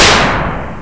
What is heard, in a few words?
blast; Sounds Terrific II; Weird Science; Amiga